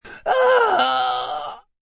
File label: Man Pain, A man in pain